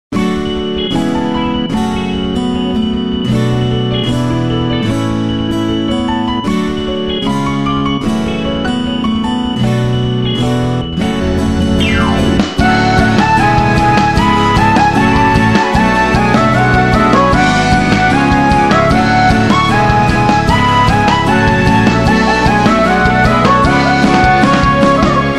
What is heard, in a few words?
bgm; MELODY（BGM）